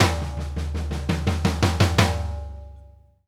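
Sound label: snare toms right, drum fills; Sampleheads - New York City Drumworks VOL-1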